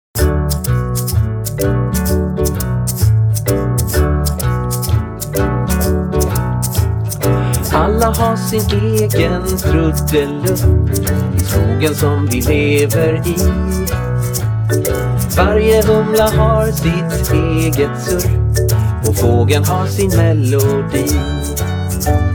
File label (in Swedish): skogamusik snabbare med fiol och krax